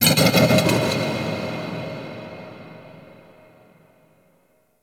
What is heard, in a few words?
Metal Impact Large; Warframe; Ambience; Cephalon